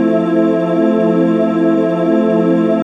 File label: Inna Tube E, Pads; Zero-G; Total Drum Bass